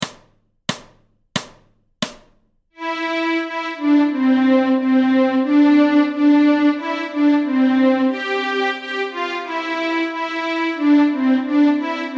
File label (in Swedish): Stråk C, Fiol; Go tell Aunt Rhody; Notträning